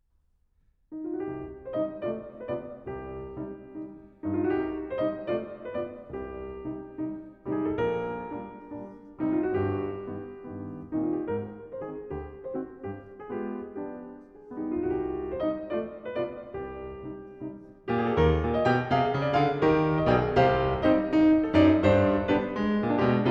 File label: Con Moto, Piano; Poetic Tone-Pictures, Op. 3 III.; Grieg Complete Piano Works